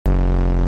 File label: core bass hit, impact